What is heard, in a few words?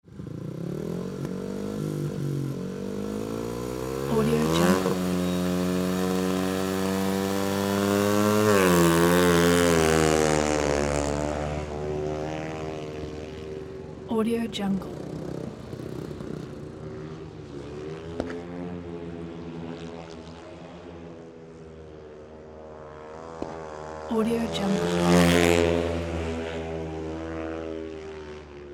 speedway practice laps